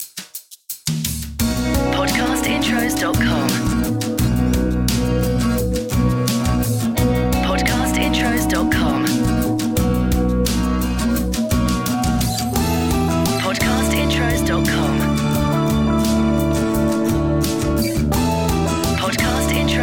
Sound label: ACOUSTIC; Podcast Watermark